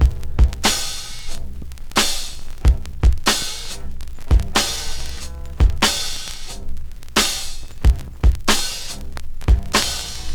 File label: 93 bpm fresh breakbeat sample G sharp key; drum loops